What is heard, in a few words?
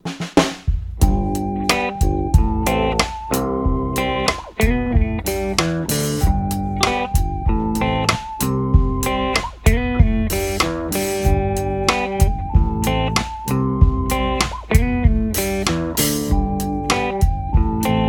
POP ROCK commercial